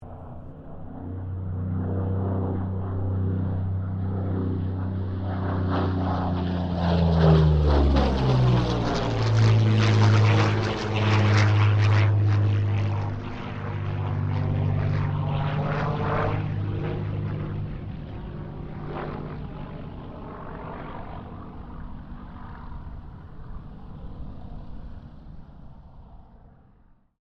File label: AIRCRAFT P-40 KITTYHAWK PASS STEREO